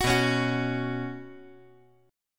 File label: B Suspended 2nd